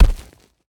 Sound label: Enemy Fall; Game rip; MiSide